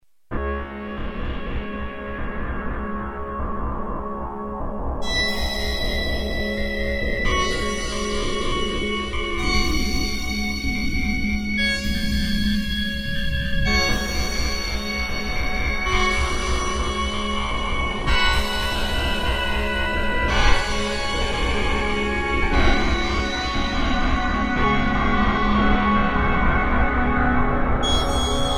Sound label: pad fm, yamaha sy99; synth; Pad: FMED 2